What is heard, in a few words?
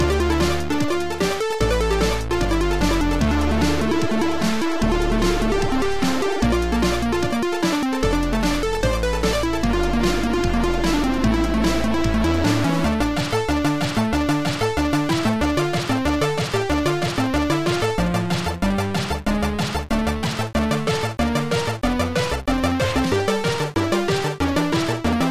C64 cavern; soundburst